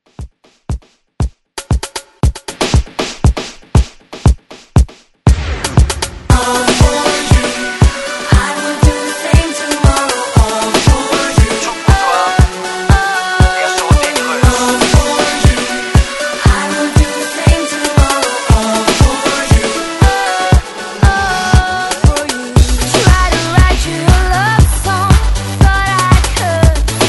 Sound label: Re Drum 118 Bpm; DJDOLLS